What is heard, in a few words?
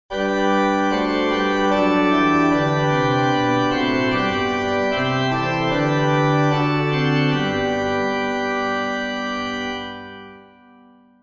National Anthem, Organ